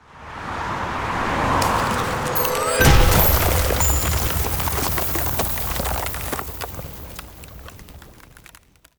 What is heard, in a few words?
Ice Spell Damage AoE Arctic Tempest; Arctic Aura